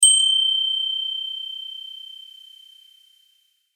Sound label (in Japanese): 風鈴